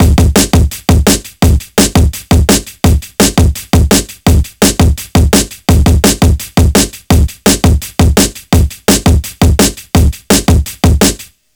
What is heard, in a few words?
169 BREAK, Explosive Jungle; USB Soundscan vol.05; AKAI